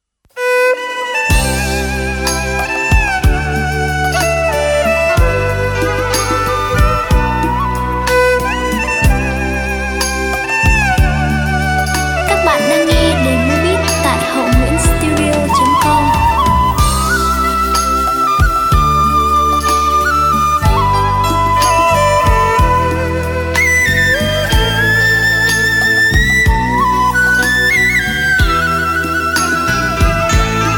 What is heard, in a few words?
beat, playback